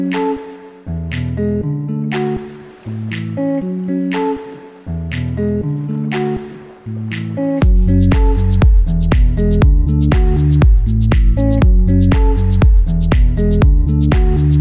Galaxy S10 Ringtone Samsung

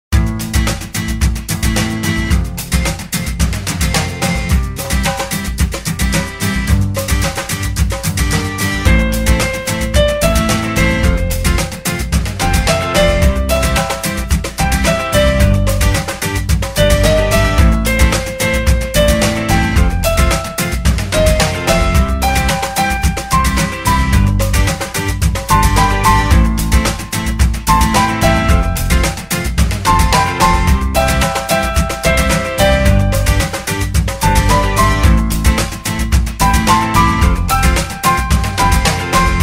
Tecno Phantom V Fold Ringtone

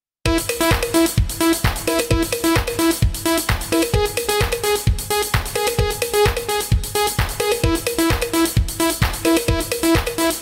Htc Ringtone

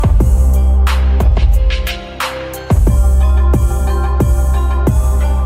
Infinix Note 5 Stylus Ringtone

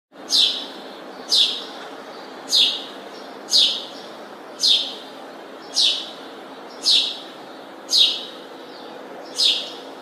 House Sparrow Ringtone

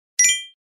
Cute Message Alert Tones

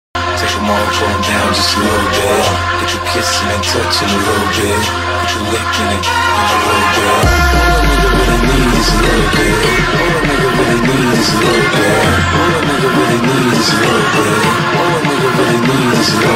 Psy Trance Ringtone

